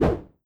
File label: swish